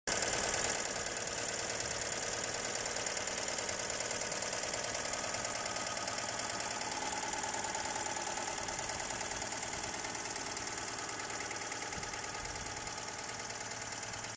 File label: Dyane, اگزوز